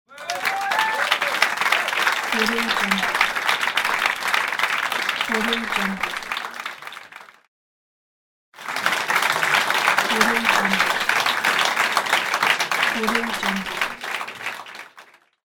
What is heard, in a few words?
applause